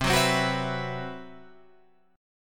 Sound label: C Major 7th Flat 5th C